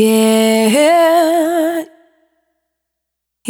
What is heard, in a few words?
Single Voice Melodic Loops; Singles